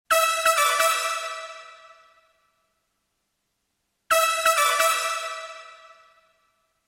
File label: Brass Korg DRV 2000 Large Instrument Reverb Vintage Digital